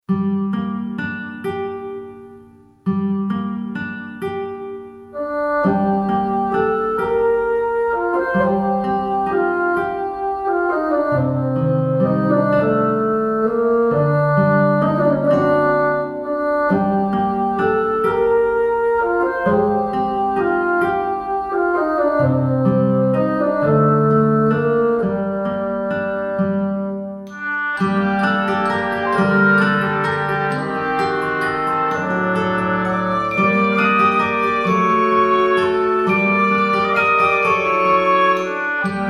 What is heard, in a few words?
bassoon